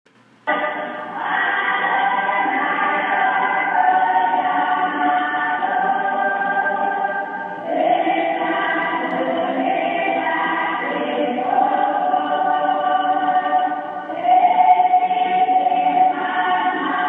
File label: Singing In Church; Tahaa